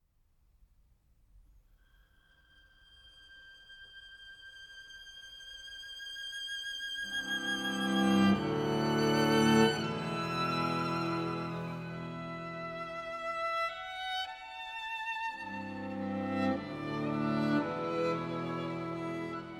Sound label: String Quartet No. 1 I. Lento Assai